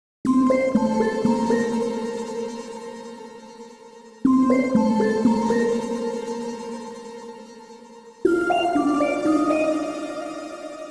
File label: Caves; Mario Series; Mario Odyssey [SWITCH]